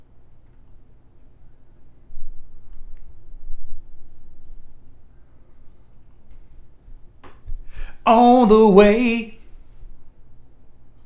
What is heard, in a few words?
vocals